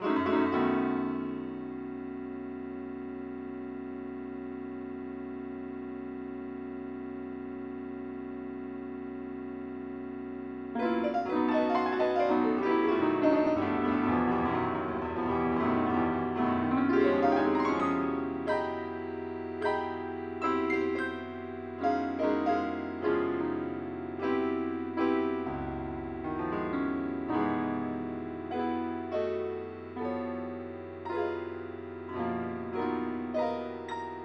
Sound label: piano